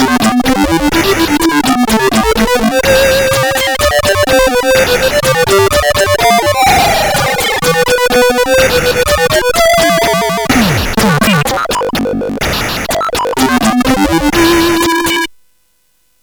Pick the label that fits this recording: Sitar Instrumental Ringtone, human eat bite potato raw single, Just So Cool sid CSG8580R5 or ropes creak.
Just So Cool sid CSG8580R5